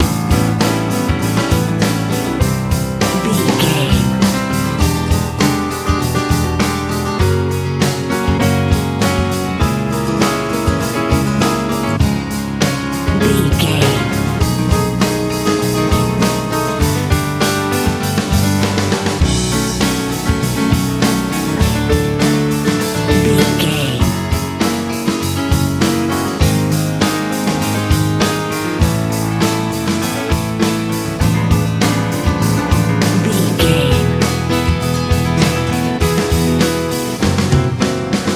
gospel worship

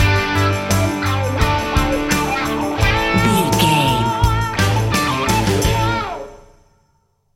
dad rock stinger

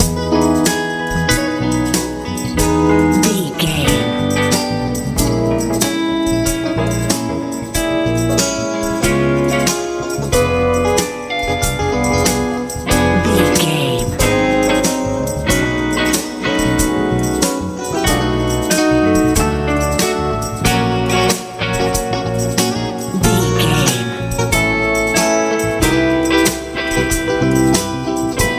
hopeful nice echo head dreams funk swing

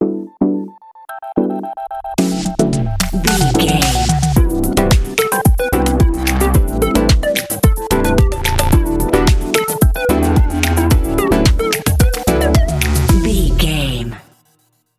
digital joy

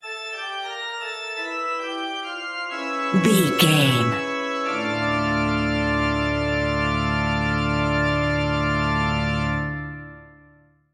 phantom organist stinger

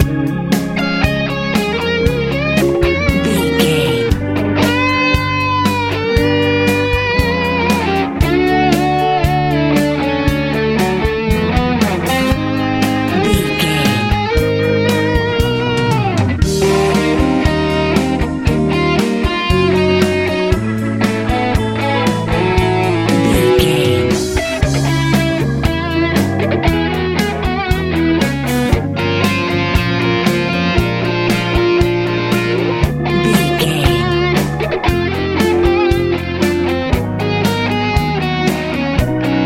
easy pop

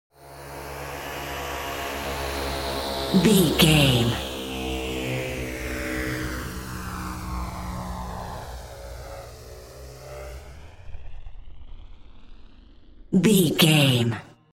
ominous war plane

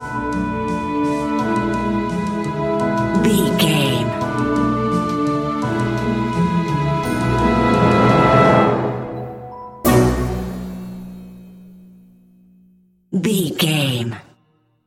clever shenanigans stinger

